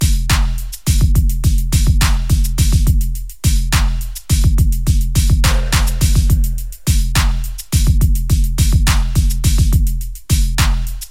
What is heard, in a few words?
sparrow modal